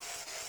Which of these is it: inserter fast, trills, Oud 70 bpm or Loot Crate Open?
inserter fast